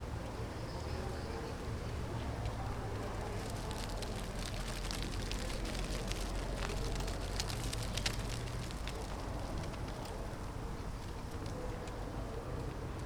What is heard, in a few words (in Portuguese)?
Ambiencia area externa reverberante folhas correndo pelo chao, pessoas; unb; passaros; universidade de brasilia